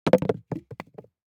DICE on Glass, Throw and Roll, Foam, Two Dice; Samples; ArticulatedSounds; DICE Sfx Party (Extended)

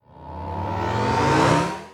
blast charge medium; Apotheorasis

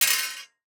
DICE on Metal, Throw and Roll, Mini, 6 Six Dice; Samples; ArticulatedSounds; DICE Sfx Party (Extended)

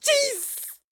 police hit bad short; donnie; Blind Drive

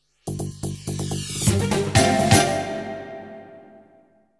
Jingles; 90 DDD Jingles

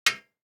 DICE on Metal, Drop, Standard, 1 One Die; Samples; ArticulatedSounds; DICE Sfx Party (Extended)

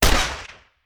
Rifle; Sound Ideas; Guns

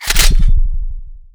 Gun Weapon Pickup; Pickups

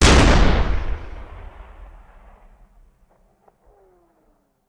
Cannon Tank; Sound Ideas; Guns

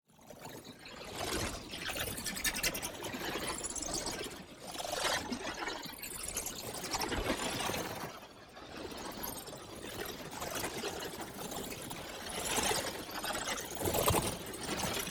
PARTICLES Digital Ripples; boom library; Matter

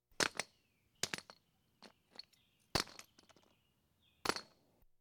Police, Fire engines; Valentino Production Sound Effects Library CD50

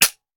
Hand Gun Foley; Sound Ideas; Guns

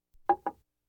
Household Phones

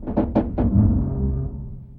Creak Large Metal Ship; SFX Kit

